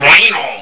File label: Multiplier Got; Barrack